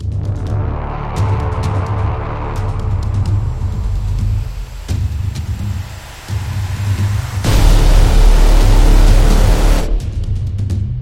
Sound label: Horror Movie Trailer, thriller